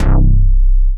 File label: PUNCHY BASS; Masterbits; Dance Trax